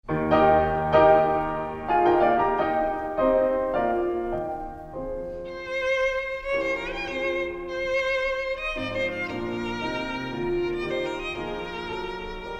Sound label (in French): Sonate pour violon et piano en mi bémol majeur; Allegro ma non troppo; Richard STRAUSS; op. 18